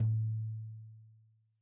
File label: Tom2 Stereo, Deeply Sampled Drums